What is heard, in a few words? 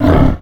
jump wolf; wolfteam